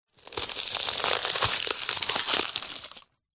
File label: polystyrene snap break; foley